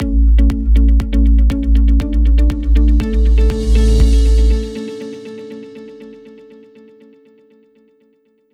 Corporate Transition

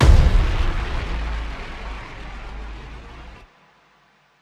Impact

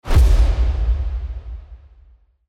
Epic Hit

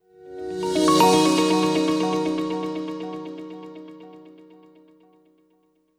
Corporate Transition

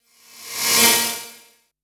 Aggressive Transition

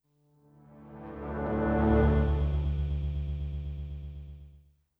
Ambient Transition

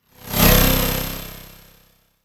Aggressive Transition

